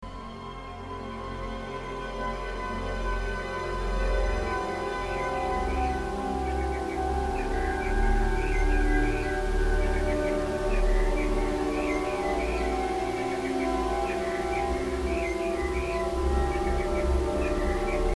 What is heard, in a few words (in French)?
synchronisation ondes cerebrales; rafraichisseur mental